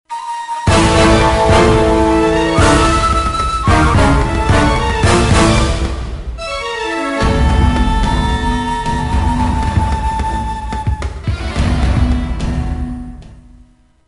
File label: Sting Egypt Battle; Age of Empires Mythologies; NDS